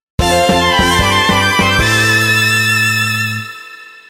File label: Jingle